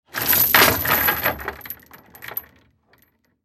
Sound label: Chain Drop Wood, Chain Drop Rattle; foley; Drop Sound Effects; Objects; Blastwave